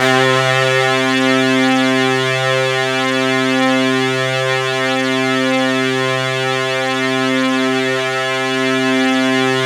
C3, Synth Line; 90 s Sample CDs; NiceBeats; SynthLine VOL-26

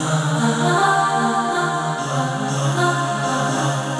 Progressive Synth, Trance

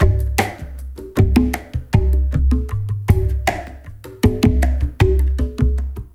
ICE BRUSH REMIX, Liquid Grooves; Spectrasonics; Liquid Grooves 1 & 2

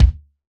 Std. Kick; Roland BK-5

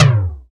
DMA SP-12; 90 s Sample CDs; Sound & Vision - Gigapack I CD 1 (Roland)

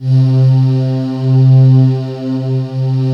WHISPER VOX; East West; Global Sounds

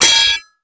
SW HIT, sword hits; Total Control - Action Cutz

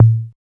CHZ TOM MID

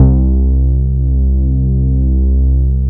BASS, 160 BPM, Drum & Bass

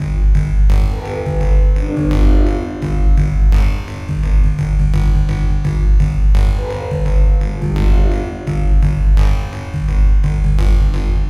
Robo Beat, Percussion

